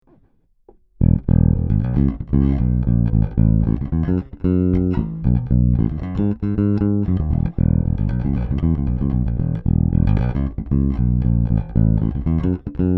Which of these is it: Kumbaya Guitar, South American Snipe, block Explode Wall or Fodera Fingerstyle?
Fodera Fingerstyle